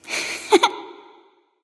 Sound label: heh heh; smite; voicepack; medusa